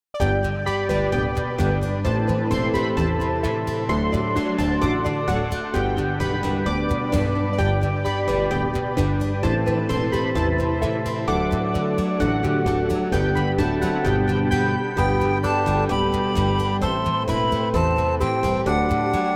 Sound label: instrumental; New Hope Music